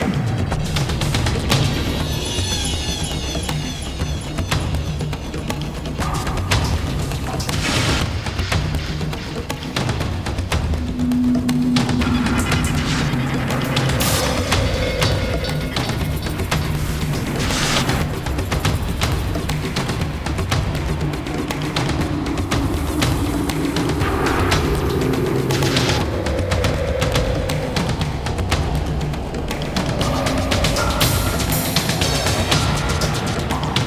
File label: music battle; freelancer-hd-edition; sigma